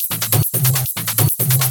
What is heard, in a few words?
Loop 140 BPM; Vengeance Samples